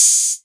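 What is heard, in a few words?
808 Hat Open; Novation DrumstationV1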